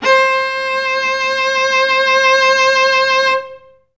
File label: CLS LG FF C4; Best Service; Peter Siedlaczek's Advanced Orchestra